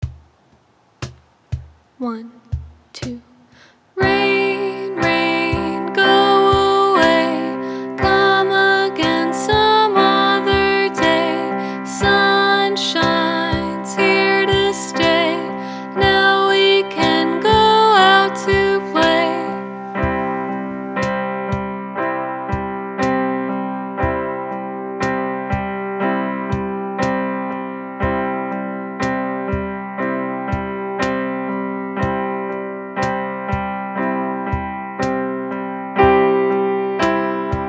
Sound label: Rain Rain Go Away C Piano